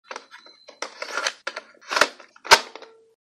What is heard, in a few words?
vhs tape